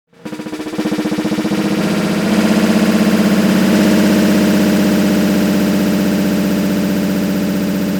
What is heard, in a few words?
snare